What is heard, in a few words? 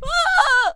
Death Vocals; Fencer